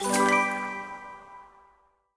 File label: wild added; serengeti kings